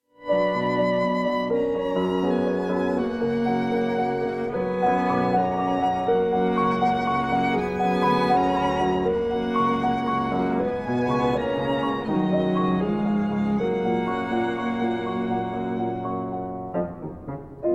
trio concertante in F sharp minor for piano and strings; trio concertante no 1